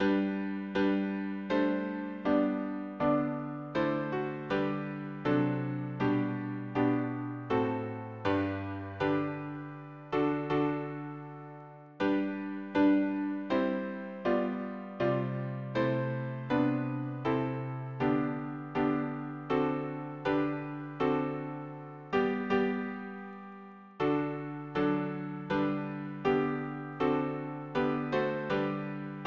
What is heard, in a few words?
harmony piano